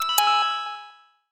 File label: Notification